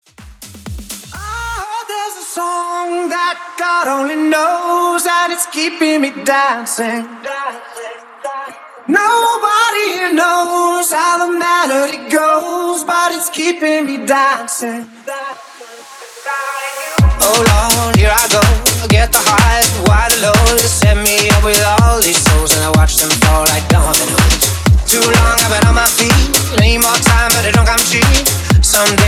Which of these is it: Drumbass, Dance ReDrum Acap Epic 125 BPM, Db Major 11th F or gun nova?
Dance ReDrum Acap Epic 125 BPM